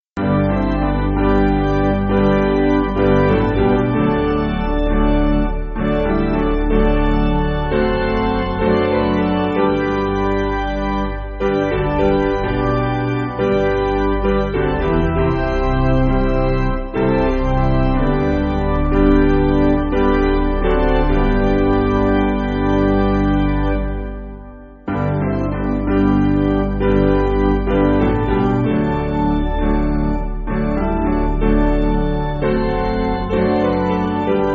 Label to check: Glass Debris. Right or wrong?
Wrong